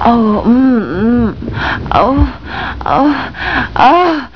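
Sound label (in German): Stoehnen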